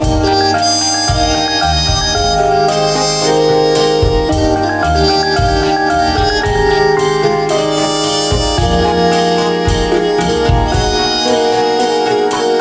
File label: Loop